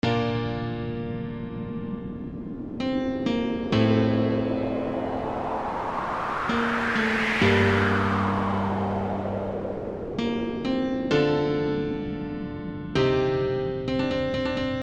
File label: creating sweepers